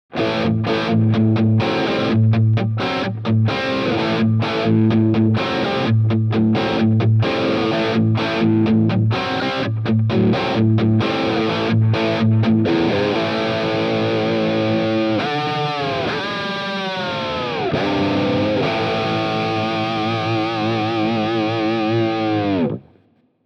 4x12 left 4x12 right Drive; Mooer Cab XG; 1960AG

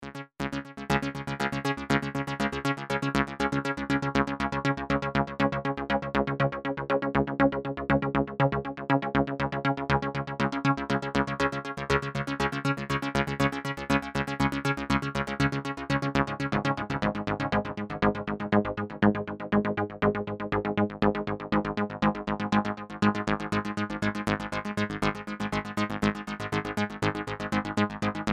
2600 ARP BASS; MobileMusic No1

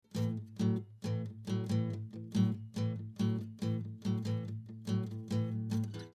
rock n roll

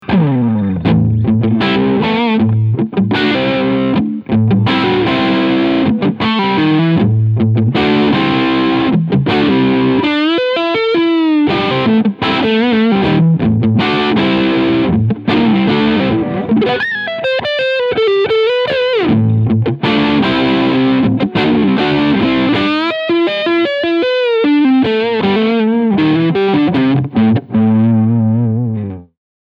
Crunch Front PU

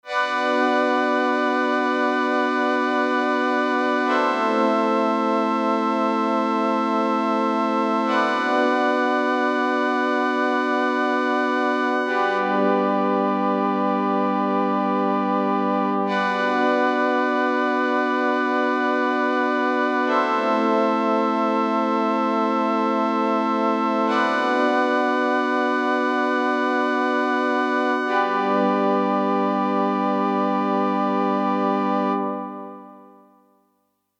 DX7 Flange HPF Drive EQ Stereo Image Transformer; SSL Fusion

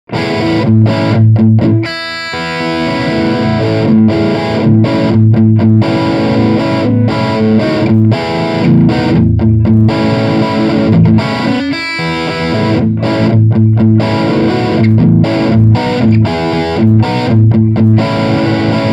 Riff Mid Gain

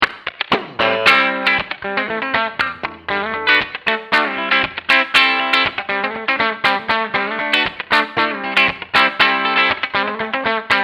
Humbucker Bridge Pickup Clean

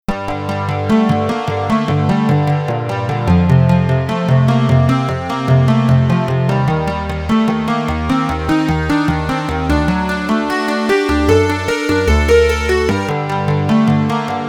iMini Sync Poly nice

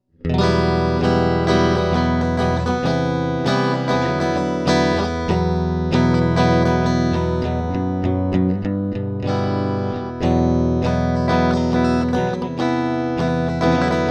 Instr. guit; Octopre

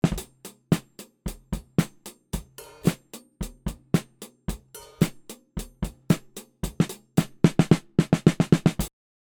Snare Polardesigner Omni

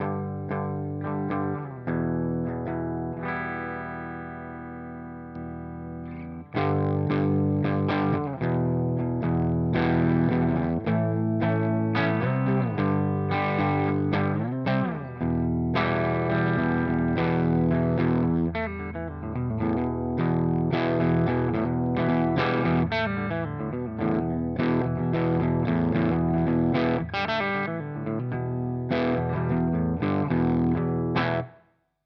Germanium Fuzz Humbucker Low Gain; Benson

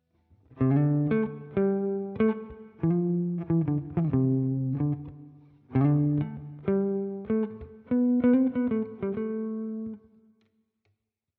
all pickup positions single riff

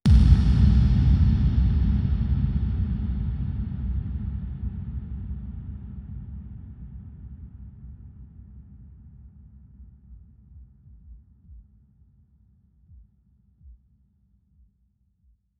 BD Wet

